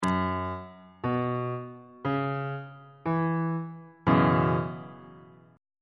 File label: g flat dominant 7th suspended 4th chord on bass clef